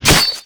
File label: Knife Shell, Damage